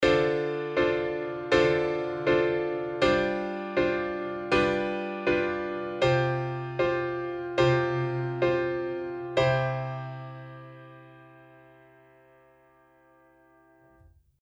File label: ii v vsus4 isus4 i